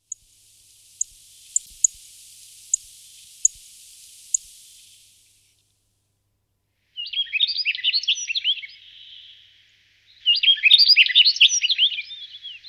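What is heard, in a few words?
Emberiza rustica, passeriformes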